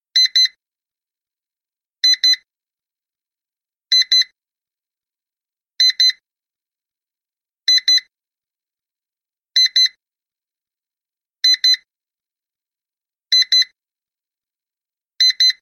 Wecker Pause Lang

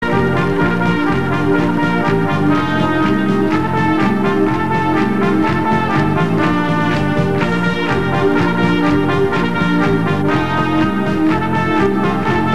Trompetenecho

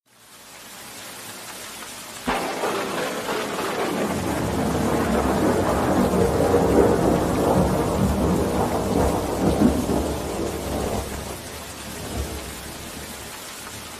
REGEN UND GEWITTER GERÄUSCHE

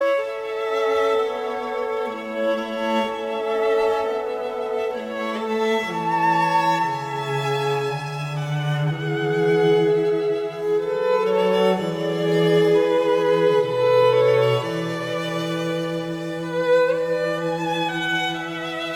Brahms Wiegenlied Violine

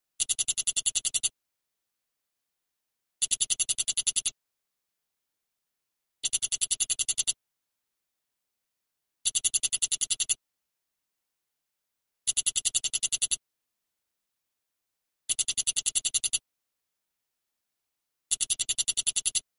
Heuschrecke

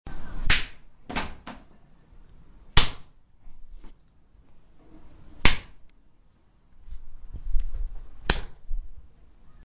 Die Ohrfeige

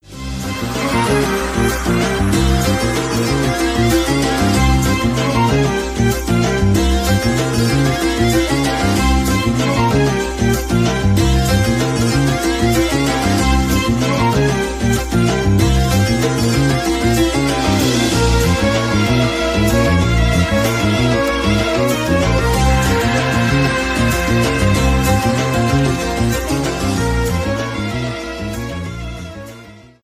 Arabisch

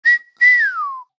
Hinterher pfeifen

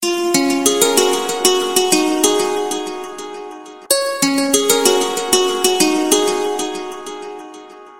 Gitarre Sms

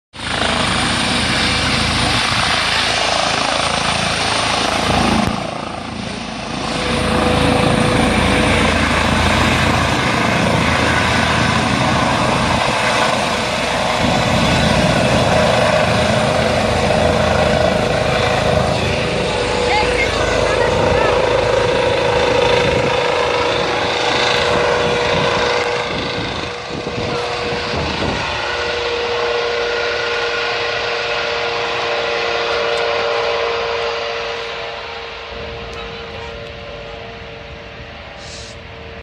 Helikopter startet

